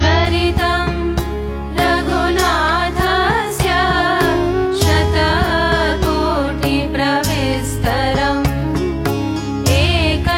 Ram Raksha Stotra Ringtone

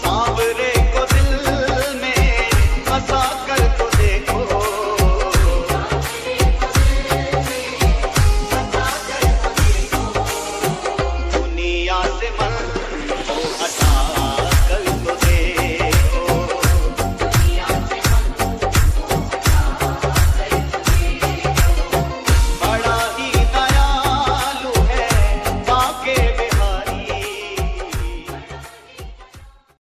Dj Remix Ringtone

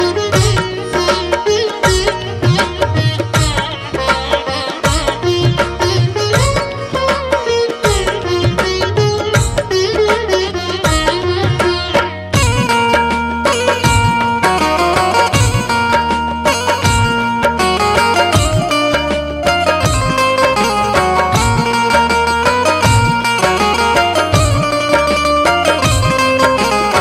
Music Ringtone

